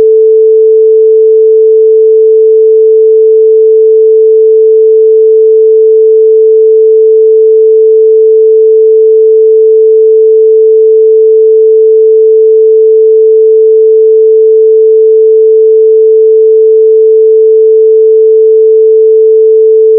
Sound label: 440Hz at 0 dBFS